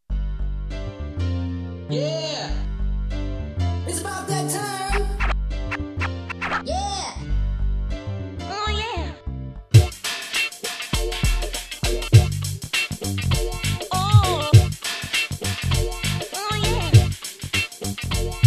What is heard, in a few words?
yamaha ex